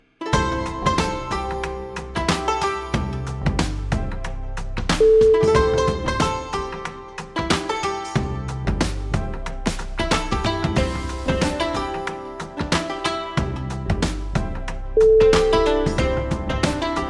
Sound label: inspiring china travel